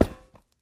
宝库踩踏声

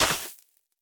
可疑的沙子踩踏声

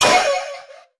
悦灵受伤声

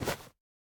收纳袋取出物品声

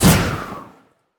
旋风人风爆声

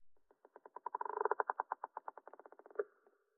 嘎枝之心待机声

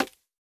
竹放置声

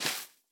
苔藓踩踏声

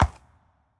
骷髅马水中缓步声

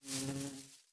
蜜蜂授粉声

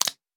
鱼扑腾声

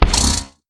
骷髅马受伤声

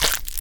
青蛙卵孵化声

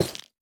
下界木悬挂告示牌破坏声

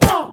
女巫受伤声

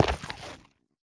菌光体踩踏声

